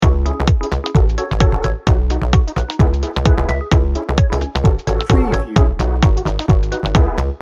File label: happy techno loop